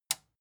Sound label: metal button tiny push enable